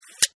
Measuring Tape Retract, Metallic Snap Of Measuring Tape Retracting; Hand Tool Sound Effects